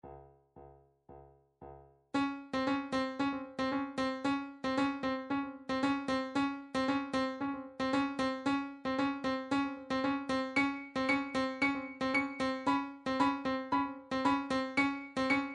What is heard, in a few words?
drum trax per